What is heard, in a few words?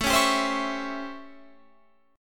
A 7th Sharp 9th, Guitar, Open F; A7#9; strummed